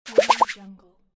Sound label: swoosh bubble pop